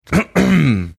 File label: throat clearing